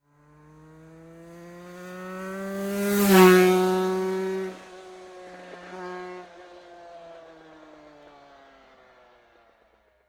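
Vehicle Moto Aprilia RS 125 Exterior Pass By Fast Accelerating, Sport Bike; 1998; MKH8040-MKH30; Dramatic Cat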